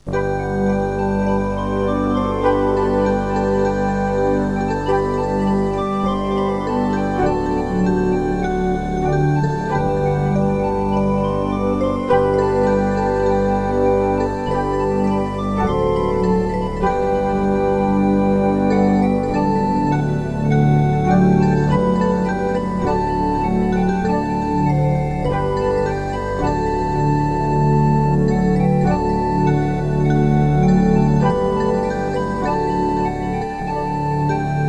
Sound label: MUSAK; Mr. Wonder's Greatest Toyshop on Earth; OmniMedia